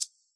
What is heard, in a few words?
analog maracas; AnlgKit1